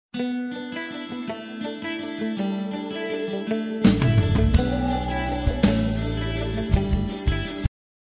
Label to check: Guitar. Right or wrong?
Right